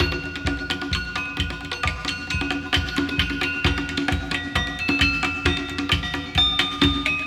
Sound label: Basis 132, Percussion Combos, Abstract World Fusion; M-Audio; Pro Sessions VOL-38